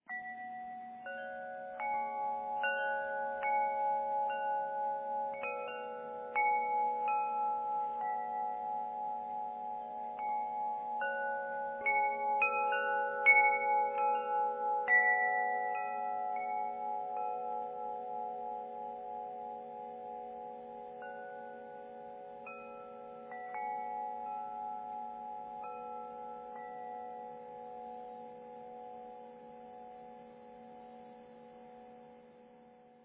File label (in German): Klangspiel; luna